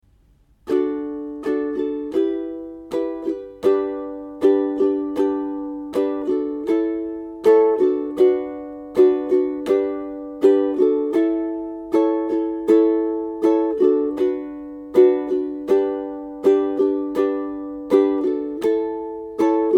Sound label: Strum, ukulele